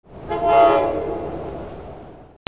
TRAINS

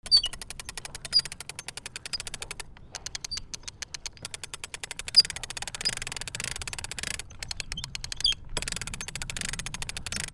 BOATS

